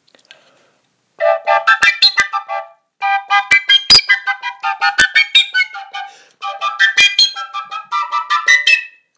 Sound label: Overtones Changing Fing; Spectrum of overtones on different fingering combinations